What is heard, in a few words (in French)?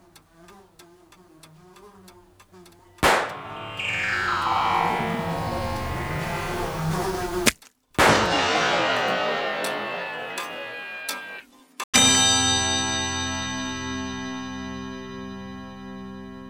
Tirs; Blind Cowboy